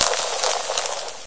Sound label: acid, bullchicken; mistake coop